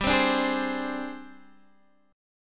Ab Major 7th Flat 5th G

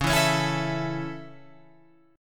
Db Minor Sharp 5th Db Guitar Open F; strummed; Dbm#5; JGuitar

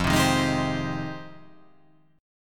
F Minor 9th F

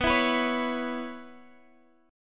C sharp Minor 7th B

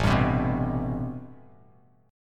A-Minor 9th A Bass Standard; strummed; JGuitar

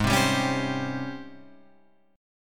G sharp Major 11th G sharp, Guitar Open F; JGuitar

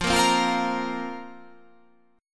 F Suspended 4th F

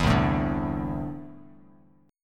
C 6th Add 9th C down Bass Standard; C6add9 strummed

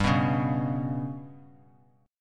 G Major Flat 5th, Bass Standard; strummed

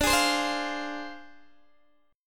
D Minor 7th Flat 5th Guitar DADGAD

